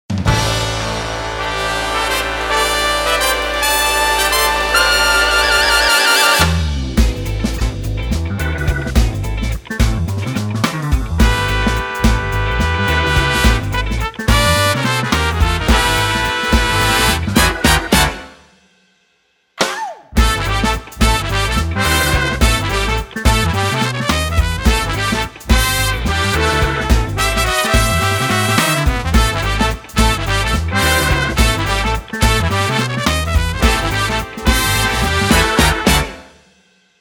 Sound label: Trumpet